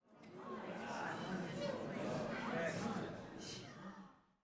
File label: crowd Chatter shrine; spellbound